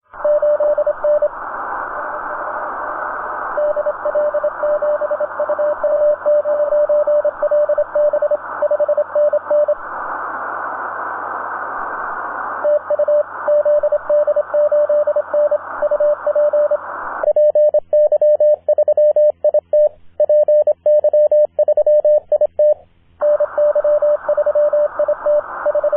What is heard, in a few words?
10m band CW; zd8n